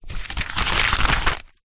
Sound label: household colouring book page turn